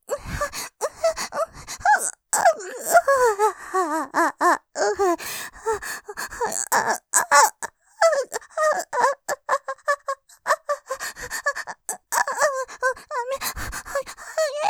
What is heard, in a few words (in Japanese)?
喘ぎ さらに 激しく 感じて、とろとろに 喘ぎ マシマシ, モノラル; おかずにスパイス◆後乗せエロエロ